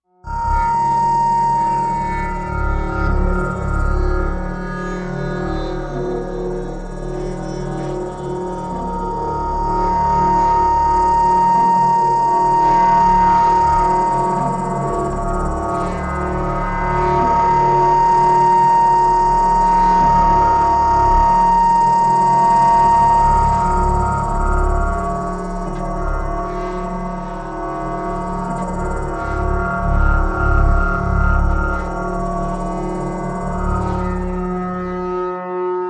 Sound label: Pushing Thru Bowed Meta, eerie ambience